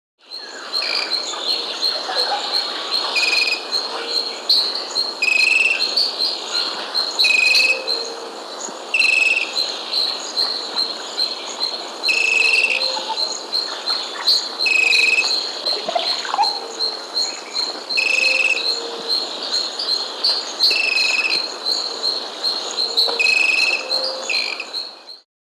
White-Bellied Pygmy-Tyrant (Myiornis Albiventris), Andean Birds; S. America